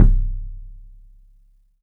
THUD KICK; 3D Drums

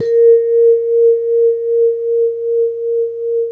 VIBRAPHONE; ILIO

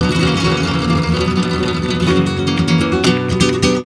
GUIT, Gipsy

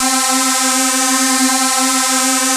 HI PAD; Q Up Arts; Dream Experience; DANCE

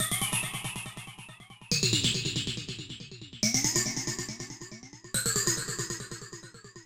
Phase Snare, Club Techno, 140 BPM; Zero-G; Construction Kits

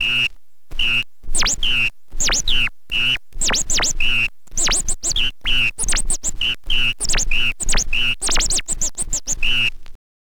scratch long, Vinyl Attack, scratches

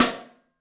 MARCH, 3D Drums; 3D Sound; MARCH XTREM

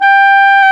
WND CLARI, Clarinets; Clar Bryt

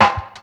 loop bit; Spectrasonics; Liquid Grooves; LOOP BITS

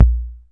KICK; E-MU Formula 4000 Series Vol. 3; Analog Odyssey; Electro Perc

